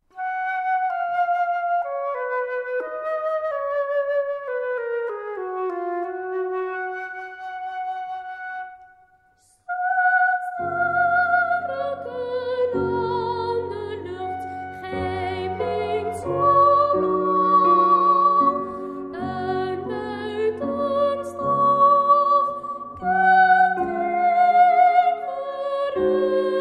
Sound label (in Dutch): dwarsfluit, piano